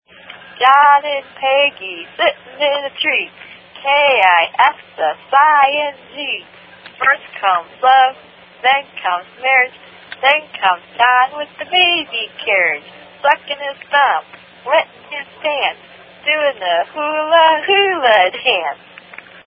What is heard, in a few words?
Sitting In A Tree K-I-S-S-I-N-G [Jumprope Rhyme]